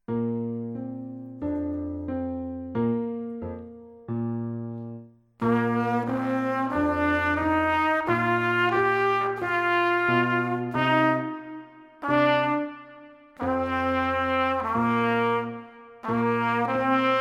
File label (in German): Trompetenfibel Bogenlied